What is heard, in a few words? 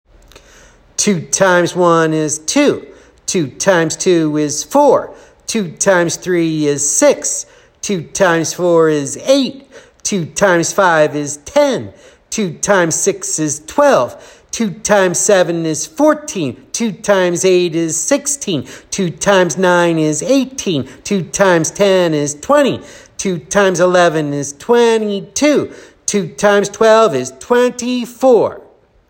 Multiplication by Twos